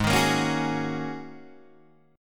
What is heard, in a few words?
G sharp 9th Suspended 4th, Guitar, Open D